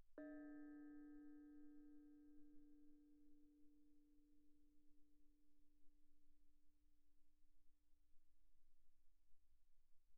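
Thai gong D4 pianissimo, Percussion, gongs & tamtams; thaigongs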